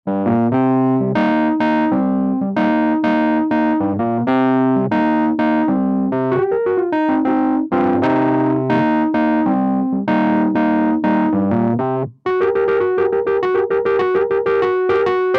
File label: Overdrive DI, electric piano